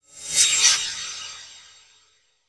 Flash Frame, Title Sequence Effects

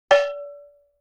Cowbell Mid; Vintage Cartoon; Drums & Percussion

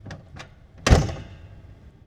1948 Diesel Truck Door Open Close; Period Vehicles

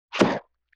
Gloop Hit; Vintage Cartoon

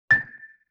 Xylophone Single Note A; Vintage Cartoon; Musical Elements

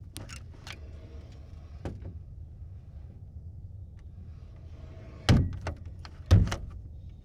1927 Sedan Front Door Open Close; Period Vehicles

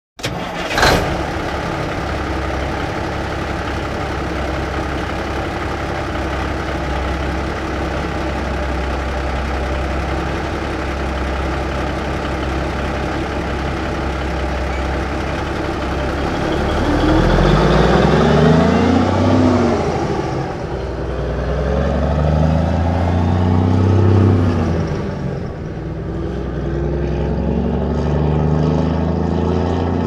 1954 Big Rig Ext Start Idle Away Medium Shifts; Period Vehicles

